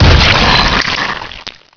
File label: gibsplt1; polyp; quakemapping; mod quoth